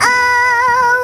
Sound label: oooh b3, Vox